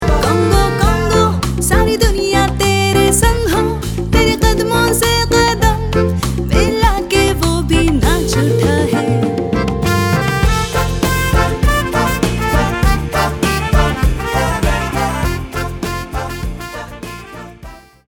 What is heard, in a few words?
CONGRATS FEMALE HINDI